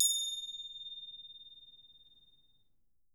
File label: Percussion A4, Harp; Miroslav Vitous - Percussion & Harp